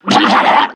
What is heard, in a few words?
bird stretcher garbage regurgitate projectile fire